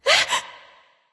gasp; smite; aphrodite

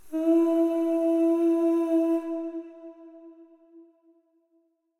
vxg sing; smite; medusa

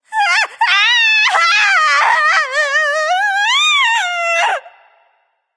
fear; smite; artemis

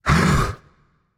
breath; Smite; baronsamedi

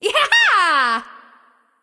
"yay"; smite; nuwa

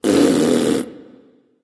tounge; smite; voicepack; osiris

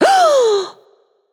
notice; babayaga; smite

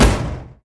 shield hit; smite; Ares

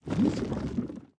death splash; smite; poseidon

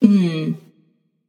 tongue passion; chaac

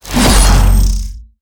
swing; smite; heimdallr

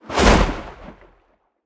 flame whoosh; Smite; Sol

